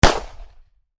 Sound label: unload, weapon; weapon-servant